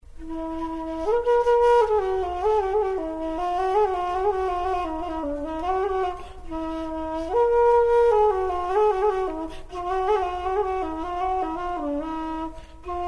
Bamboo Flute D

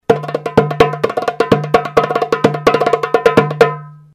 Persian Drum; ceramic drums

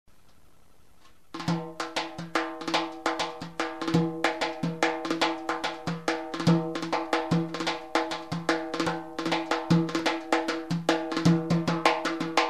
naghara

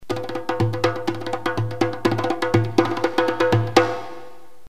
Sumerian Drum; ceramic drums

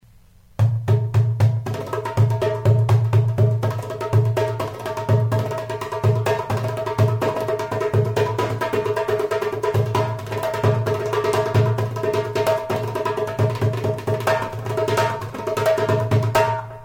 Persian Drum; ceramic drums

